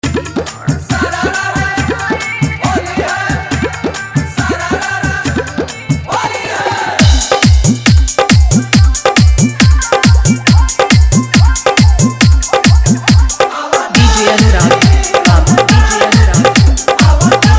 Holi Dhollki Mix

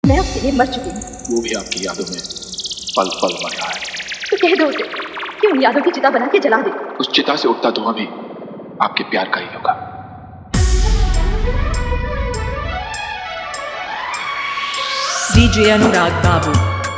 Dhollki Sad Mix

